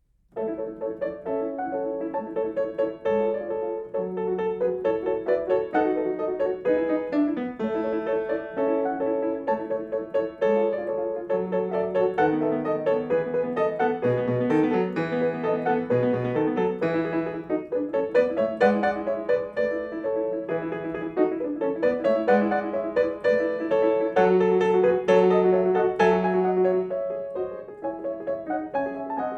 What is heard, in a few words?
Joyous Peasant, piano; Mendelssohn; Op.102 No.5